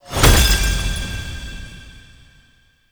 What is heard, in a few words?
Crystal Despawn, UI; Citrines Last Wish